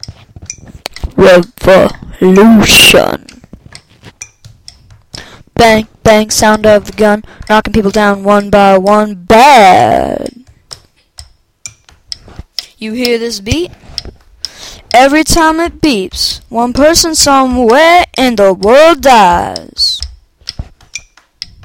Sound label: rap